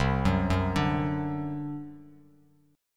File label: C Suspended 2nd Flat 5th C down Bass Standard; Csus2b5 arpeggiated